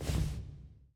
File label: Player Paddle Attack Layer Snow Light